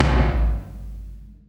Tom, Gated Reverb; creative gate; musicradar

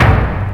hit, PERC; Techno Trance; DRUMS; Techno Trance Essentials

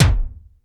RY30 KIK; Hollywood Edge; The Big Bad Beatmachine

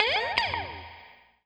NOIZ, Guitar; 90 s Sample CDs; Quantum Leap - Guitar & Bass

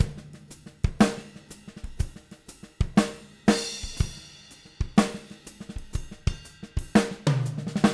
121 DOWN, DWNTWN, Living Drums; Sampleheads; Peter Erskine

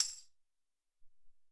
Tmb Mt

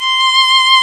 ARCO VIOLIN, Arco Solo Violin; E-MU Producer Series Vol. 2 – More Studio Essentials

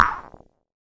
VM1 Electric Marbles; 300 Drum Machines; DevSnd

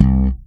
SLP POP C, PEDULLA BASS; Masterbits CLIMAX 7 - Bass

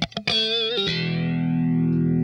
SQUASH LIK, Funk Guitar; 90 s Sample CDs; Zero G

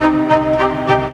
Headless Strings; M8

